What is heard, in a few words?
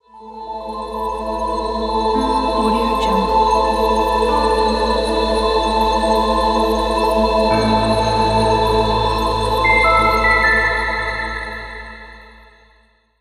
stellar logo